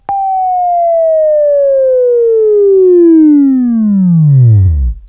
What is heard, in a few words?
Buzz, Sweeping Training